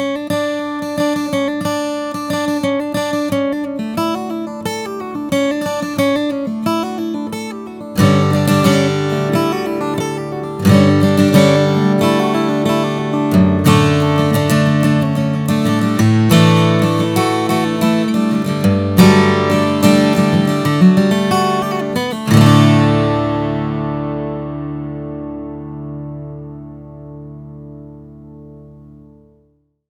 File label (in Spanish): Rasgueo